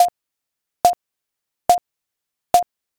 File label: thinking time